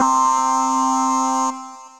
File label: Roboclaver; Ableton; Retro Synths; Vector; Vector Performances